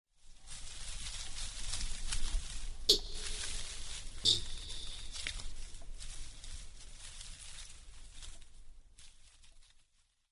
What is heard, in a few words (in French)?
grand polatouche branchage